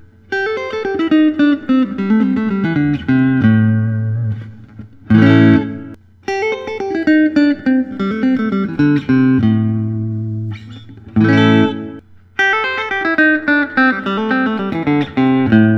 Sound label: vinetto sc 50 bg artifact buttersc